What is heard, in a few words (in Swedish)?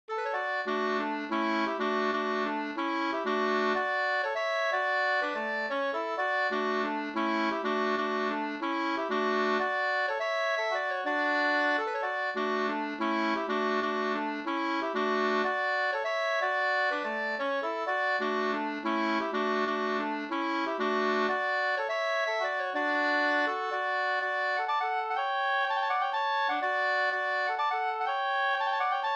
hamburska, 2st; Urburskan Sd